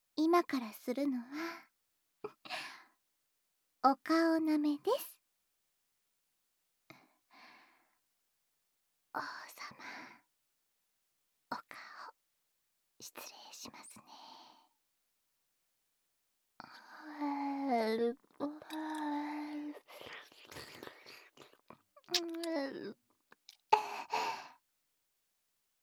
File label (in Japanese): 『顔舐めペロペロ』